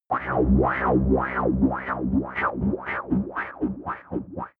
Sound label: transmission buzz wah wah